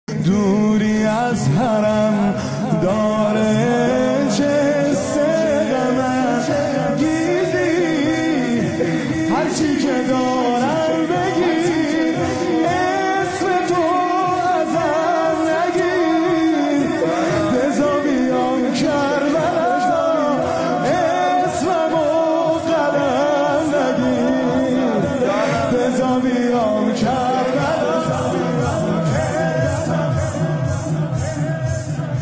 مداحی

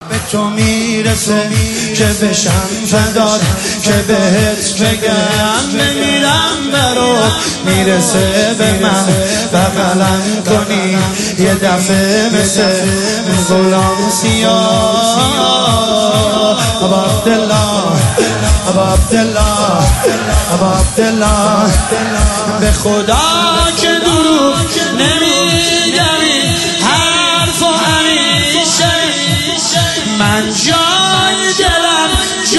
مداحی; شور

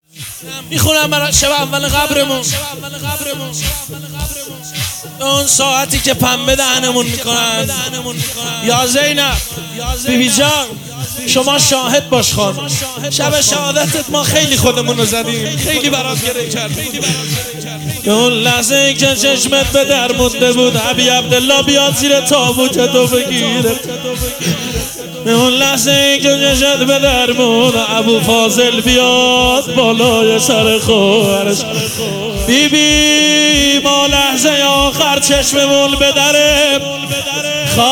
روضه خوانی

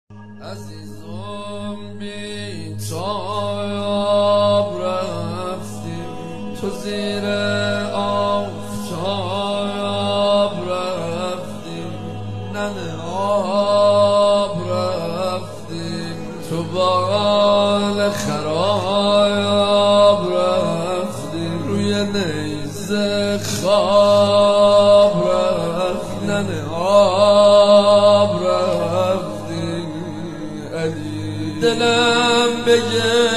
مداحی

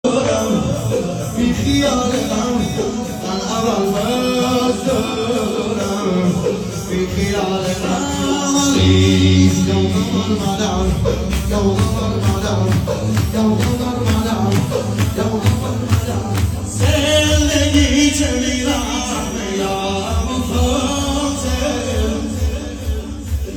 مداحی شور

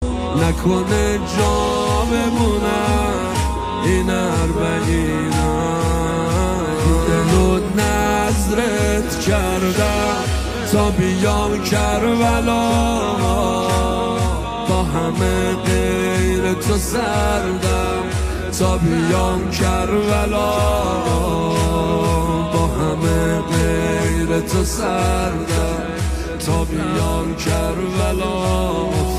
مداحی

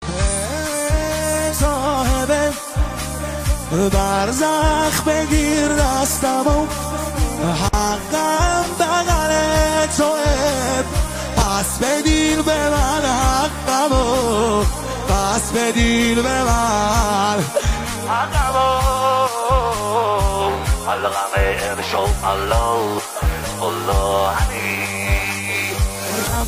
مداحی

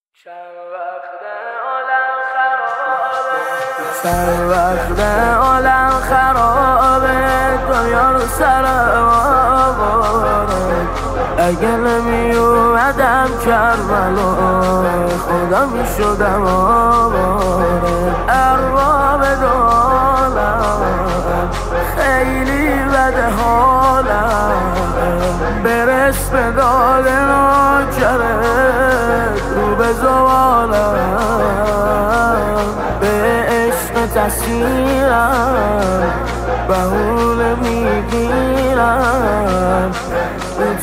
مداحی

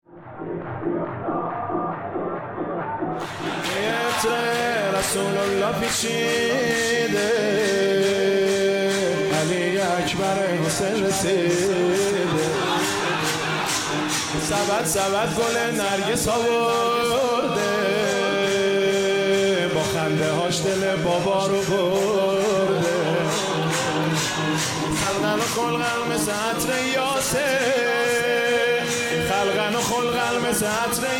مولودی

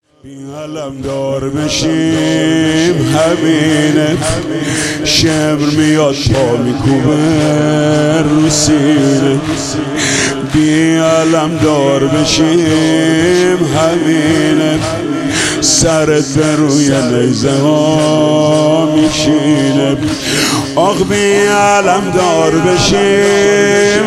مداحی

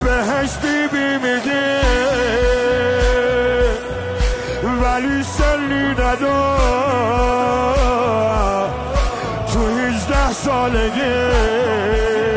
مداحی

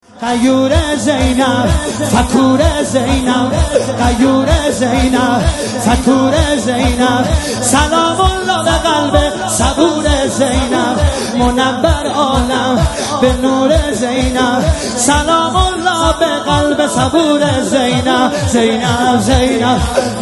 مداحی

